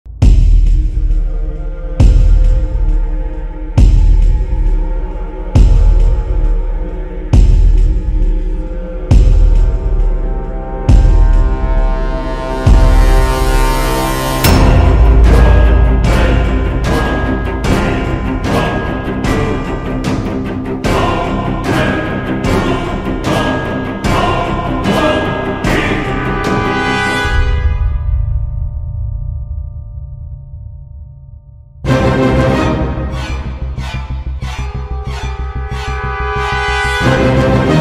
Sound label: Villain